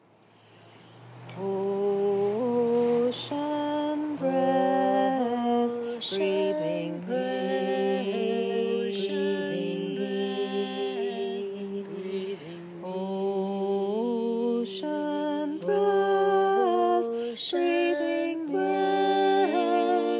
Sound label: Round